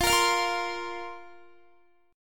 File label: F Suspended 4th F, Guitar; Fsus4; strummed; x,20,22,22,22,20